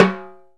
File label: LOTMB, Timbale